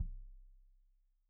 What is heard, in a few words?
Kik Stereo; Drum; Deeply Sampled Drums; SMDrum